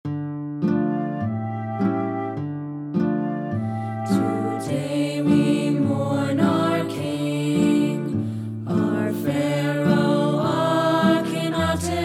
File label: dirge